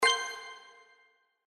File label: Btns; The Lucky Fairy